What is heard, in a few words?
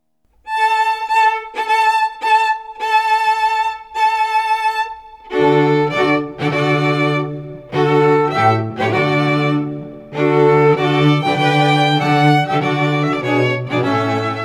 BRIDAL CHORUS, string quartet